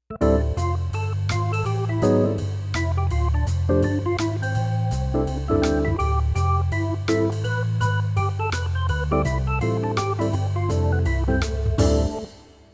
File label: M3 Jazz Mix; Organimation